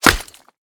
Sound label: Bullet Impact Tile